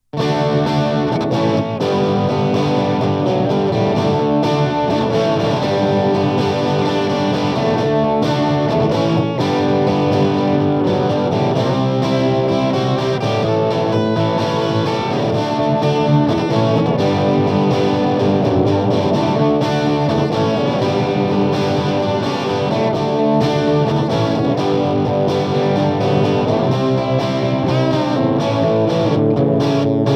MAXON OOD9

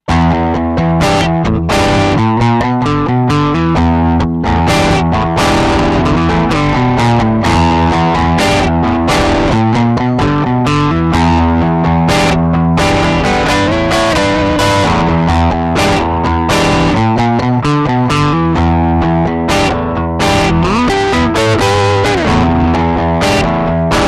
Maxon CS9 Pro Vintage Vibe Normal

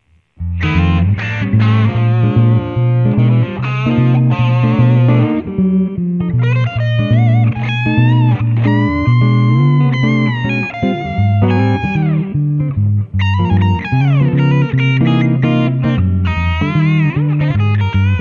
MAXON OD820

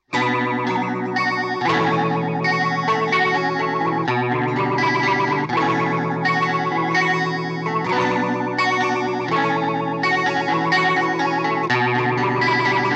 MAXON PT9PRO+ Tremolo

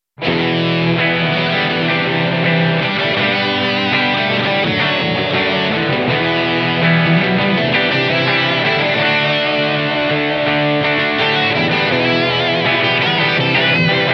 Maxon ST9Pro+ effect

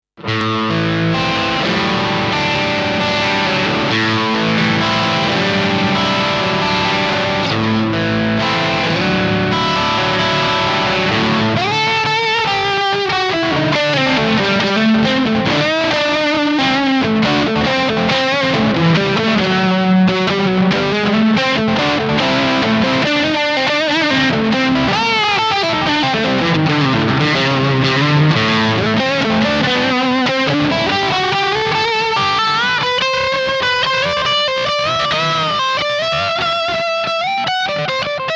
Maxon AD9 For Solos Effect

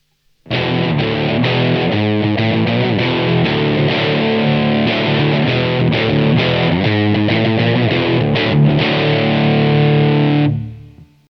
Maxon TOD9

